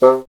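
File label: wind bassoon C; Miroslav Vitous - Solo Instruments VOL-1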